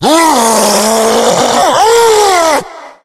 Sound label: hulk yell, tank voice yell; Left 4 Dead; Source Engine